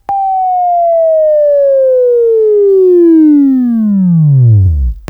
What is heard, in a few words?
WAV Right, Sweeping; Sweeping Training program